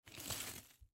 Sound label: Pocket Knife Open; Pocket Knife Open Pick Up Dirt; Knives; Foley; Sound Effects:Combat; SoundEffects-Blastwave